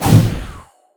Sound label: shoot; SuperTuxKart